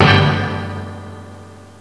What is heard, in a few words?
Tang